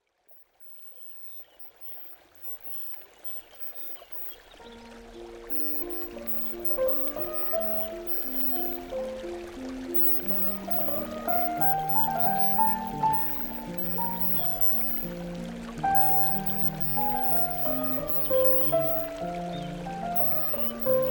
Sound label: stream; dr mozart